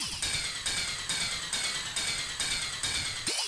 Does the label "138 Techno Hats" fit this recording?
Yes